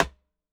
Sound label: SNARE RIMSHOT